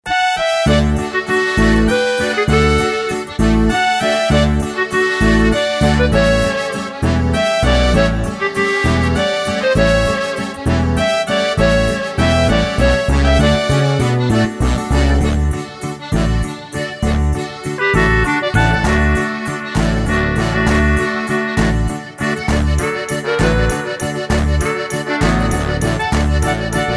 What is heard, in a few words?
oberkrainer waltz